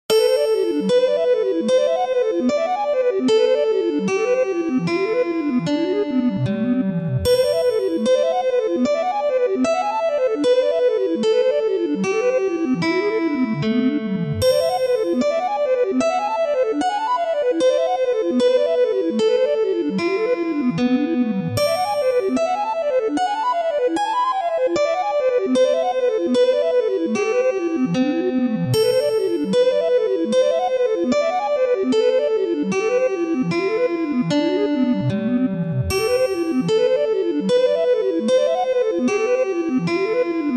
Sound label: recorder and guitar melodic minor; fractal music; Sloth canons